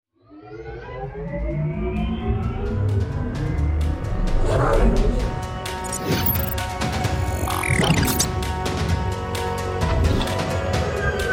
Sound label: modulated movement sound fx